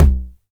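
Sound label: 909 low tom tape; Goldbaby Tape808 & Tape909; The Tape 909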